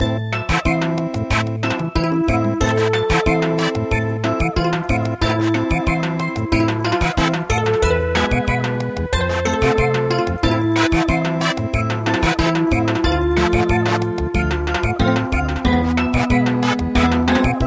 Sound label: Samba; Bubuy bulan